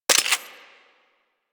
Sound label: Hand Shotgun Prime Alt Mech